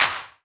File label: Claps; Vengeance; Essential Clubsounds Vol.4